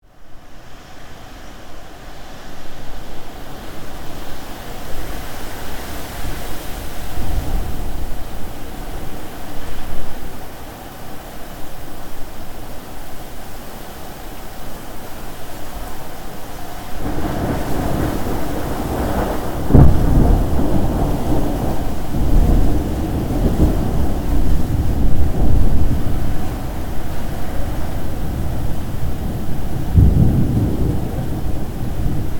storm coming; nature

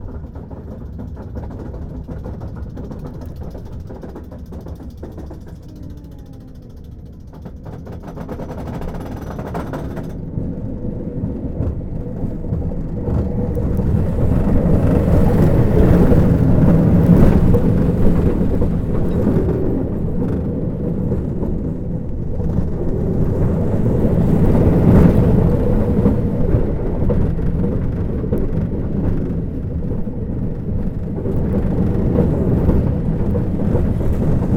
roller coaster

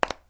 seasoning lid; cooking

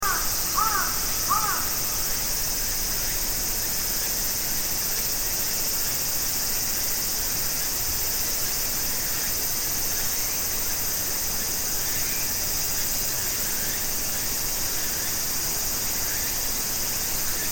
summer hill

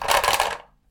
toilet paper; トイレットペーパー３

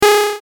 powerdown

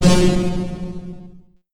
magic waves

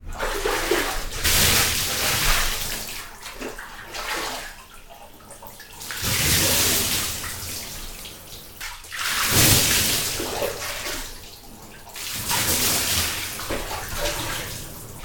bath

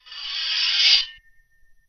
extracting a sword; jidaigeki

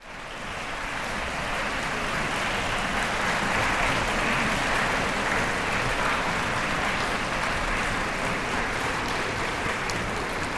performers enter

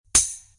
sword attack; jidaigeki

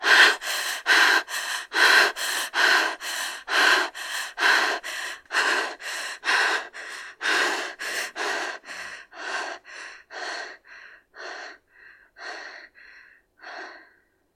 woman after run; horror

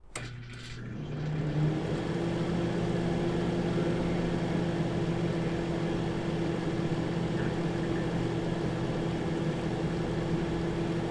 microwave; 電子レンジ２; cooking

